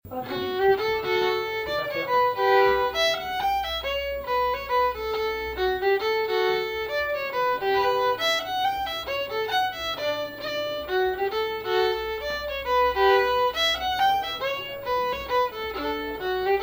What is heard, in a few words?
Mazurka